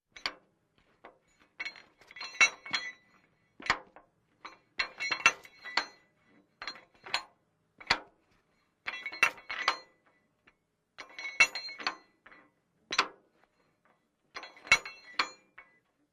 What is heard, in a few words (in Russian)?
Zvuk tanka; Звук танка; Звук танка в движении, заводка, старт, остановка внутри и снаружи. Выстрелы танка